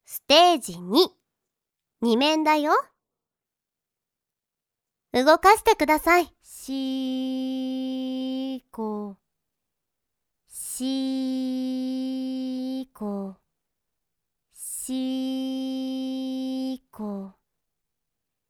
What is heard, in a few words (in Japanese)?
深呼吸と耳舐め